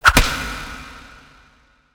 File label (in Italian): Frustata